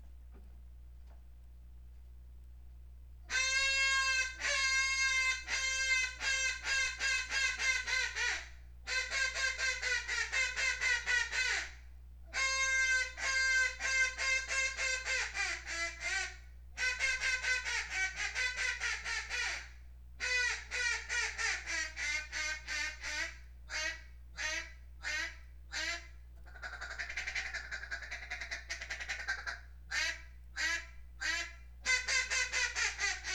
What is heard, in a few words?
Reelfoot Metal Reed, duck sounds